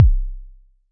click simple, Kicks; single hits

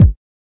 kick

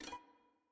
Nylon Hit, Guitar Body and String Hits

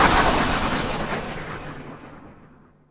Electro FX; Ueb HE Electro FX

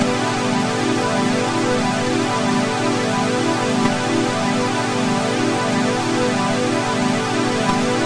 mks pad 7; Pads

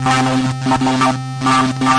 Lead, Synthesizer Middle C Notes

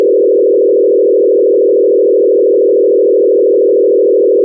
laser treatment, Electro and Synthetic

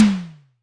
noise snare; single hits

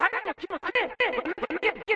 122 cut up japanese vox; VOCAL OR VOXY LOOPS

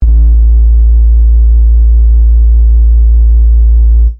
edge of distortion bass; Basses

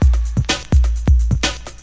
oldskool break, drum loops and breaks

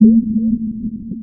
note ambience, synth

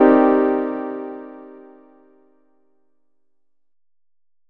stab satisfying

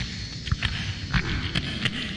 ambient snap loop, drum loops and breaks, 093 bpm; 091 to 100 bpm

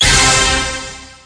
orchestra hit; Punches Hits Discoblasts; single hits

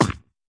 tight hit; Hip Hop; STYLE KITS (Hip Hop, Etc)

